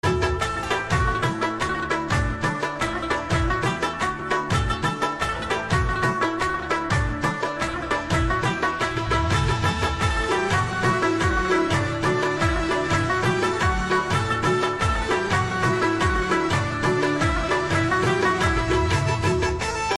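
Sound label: rabab Pashtun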